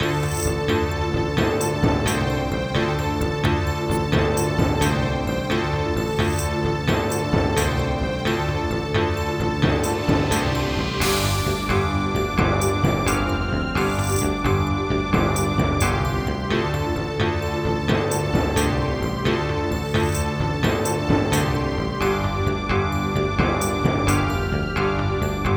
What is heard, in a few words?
LG MIDI ART GS1000R; Heretic